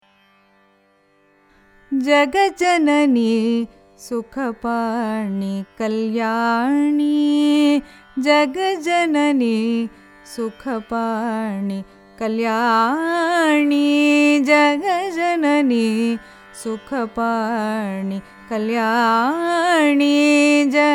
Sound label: raga rathipathipriya carnatic; carnatic music